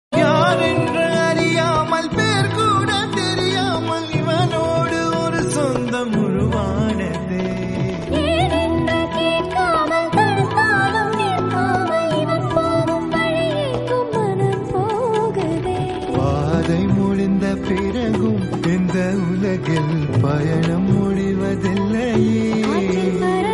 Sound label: tamil love song, love bgm ringtone